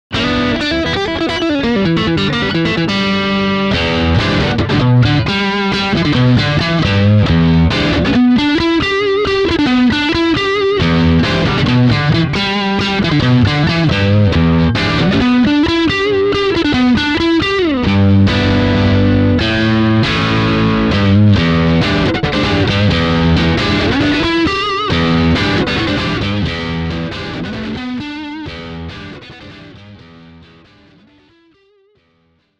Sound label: Classic Rock Texas Blues, gitar